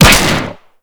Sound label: Fire Shot; Snipetron Vandal Fire 2D; Warframe; Weapons; Rifles